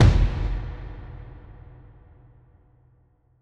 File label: Tom, 135bpm; Zero-G Sound Sense Grime Scene; Construction Kits; A Positive Vibe